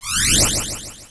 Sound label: Zero Walk; Super Mines Demo